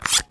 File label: sweat; buckler